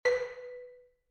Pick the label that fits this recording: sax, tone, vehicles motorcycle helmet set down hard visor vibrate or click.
tone